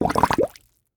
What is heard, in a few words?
water large bubble; a dump in the dark